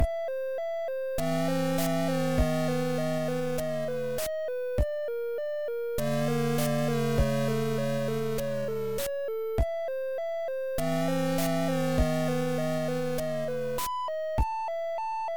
Ghost House; SMM2; SMB3

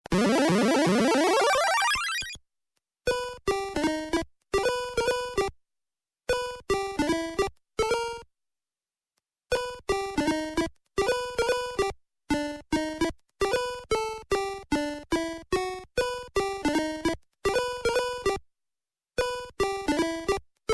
Place the Platform, Platforms theme; DK'94

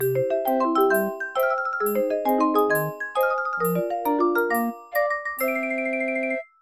Course Clear

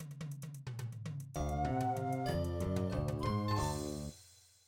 Losing a level; MvDKMMA; Floor 1-Plus

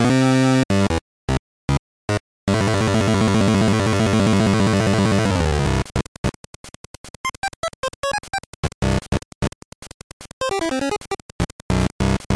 Boss Battle, A music track; WL2

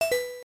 Casino Coin Lose

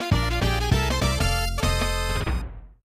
Jingle played when clearing a bonus; DKC3

